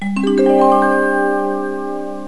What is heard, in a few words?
heal